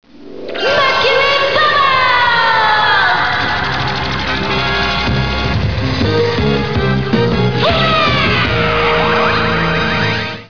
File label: bubbles